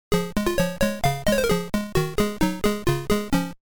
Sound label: song Game Over; Frogger; Arcade